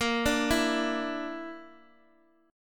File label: Bb Major Flat 5th Bb down Guitar DADGAD, chord